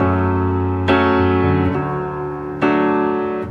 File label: piano, Sad Loops